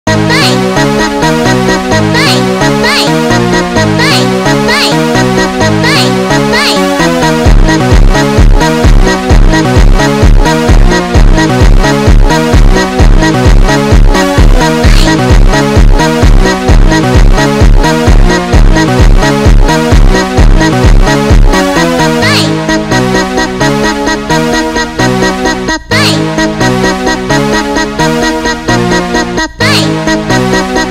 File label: Phonk Automotivo